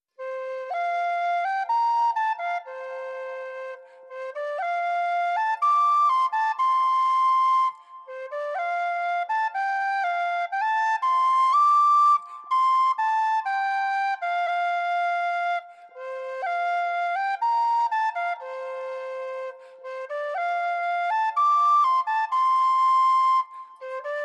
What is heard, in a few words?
Whistle Solo